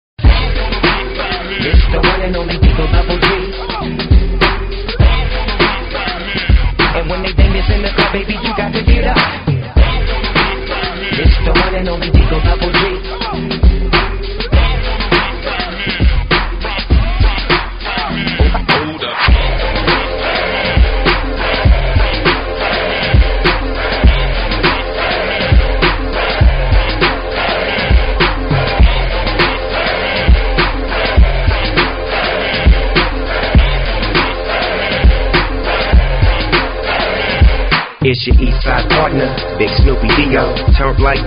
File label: funk; multitrax